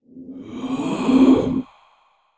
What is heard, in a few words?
Deactivate, Vision; zps; Zombies